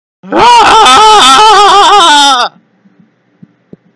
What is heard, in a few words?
scream; Retarded Scream